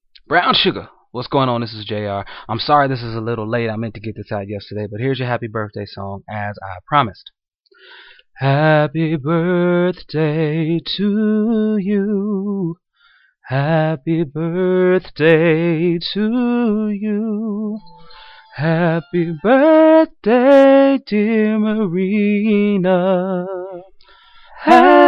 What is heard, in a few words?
birthday song